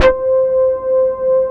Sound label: Poly800 C3, Korg; Back In Time Records; Korg Universe VOL-1